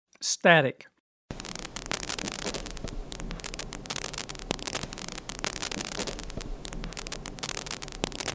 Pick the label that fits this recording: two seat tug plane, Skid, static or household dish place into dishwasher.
static